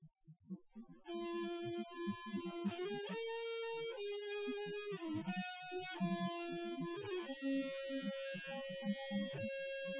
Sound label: nodrums inst src; SiSEC08; SiSEC underdetermined